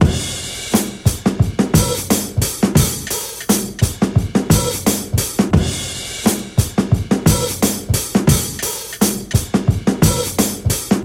87 bpm breakbeat sample d sharp key, Free drum beat - kick tuned to the D# note.